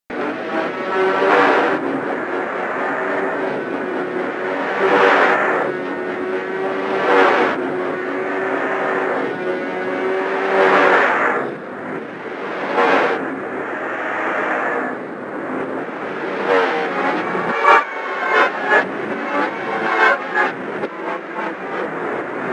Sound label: Radio